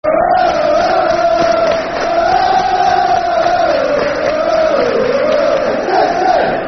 Football Chant (Soccer Song); Der, Der, Der City; Bristol City Bcfc

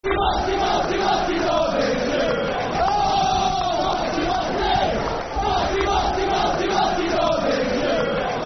Football Chants (Soccer Songs); Matty Derbyshire; Blackburn Rovers Brfc

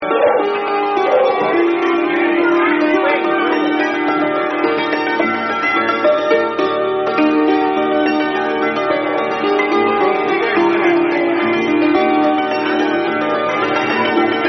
Hornpipe

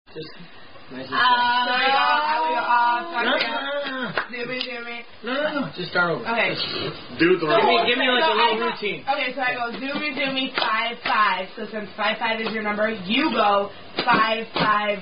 drinking game; Teaching Dad To Play Zoomie Zoomie

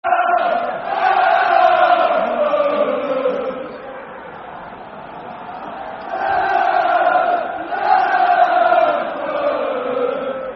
La La La Fulham, football chant, soccer songs

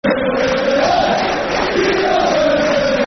Football Chants; Deon Burton; Sheffield Wednesday Swfc

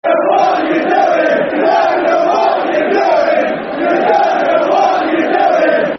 You Don't Know What You're Doing, Football Chant; Nottingham Forest Nffc

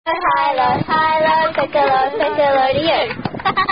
Hi Low Piccalo; janice ackerley collection

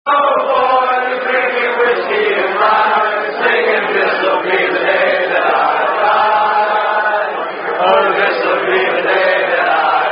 Sing-A-Long; Bingham Cup 2008

